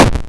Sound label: explosion; countdown mayhem